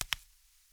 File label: Tile; blindside